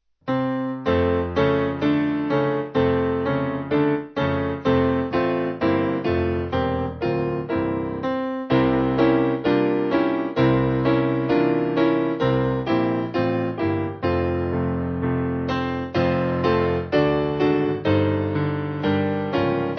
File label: Piano